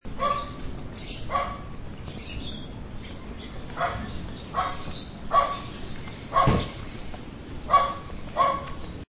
aso ibon lakad

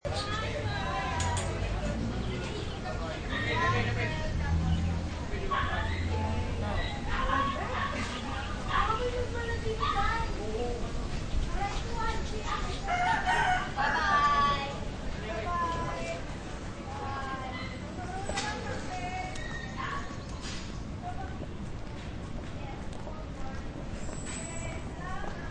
naglalakad

